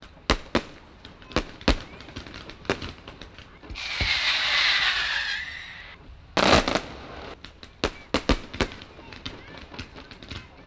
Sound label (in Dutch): vuurwerk